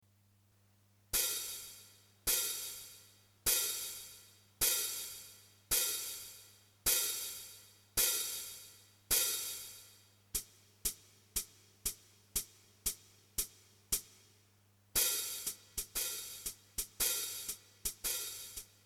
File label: hi-hat